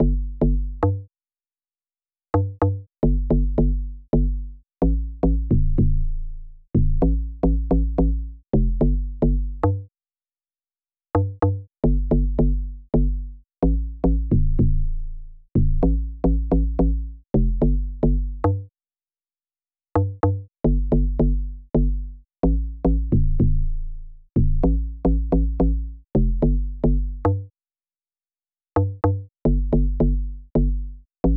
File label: 109 BPM D Min Bass, Afrobeats; Melodies; Loop Stems; Cymatics; GHOST (Beta Pack); Bembe